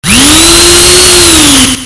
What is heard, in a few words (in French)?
perceuse